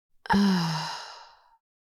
Adult female pleasure moan

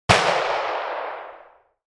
Beretta handgun single gunshot distant

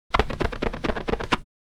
Window squeaky clean wiping and screeching

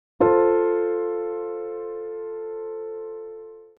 Piano F major chord

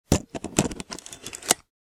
Professional camera removing the lens

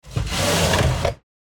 Heavy toolbox dragging; hand tool

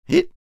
Funny male hiccup

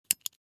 Metal flashlight button press to switch on or off

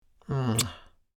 Mature female kiss with vocalization

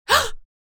Shocked female vocalization

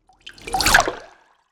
Cinematic water whoosh

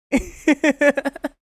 Laugh anime boy vocalization

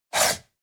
Piece sliding on wooden board game

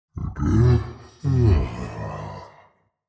Demon king lord yawn

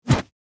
Grenade throw launch whoosh; Military Equipment

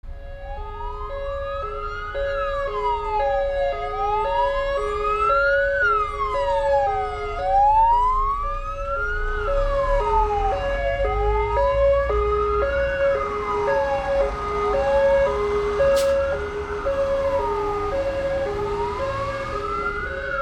Fire truck passing by with siren

